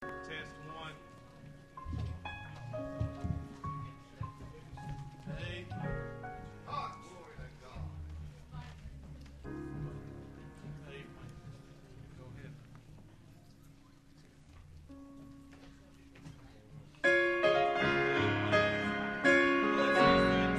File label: choir